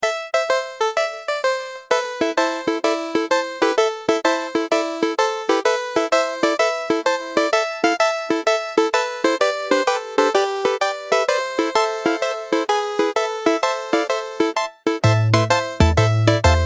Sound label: tavern folk, music atmosphere; freepixel